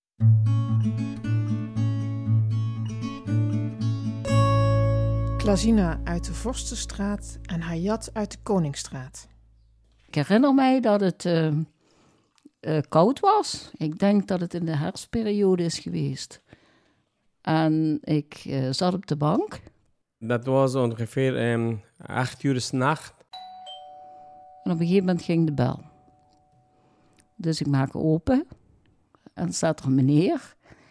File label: djembe; cultura nova 2018